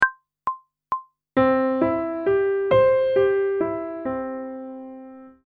Major Arpeggio one octave ascending and descending, pop vocals; grade 7; Technical Work